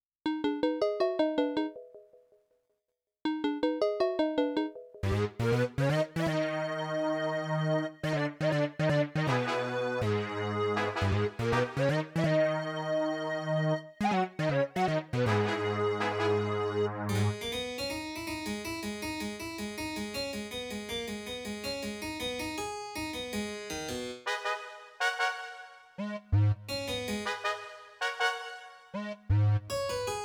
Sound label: Fight C Melody; Plants vs. Zombies Battle for Neighborville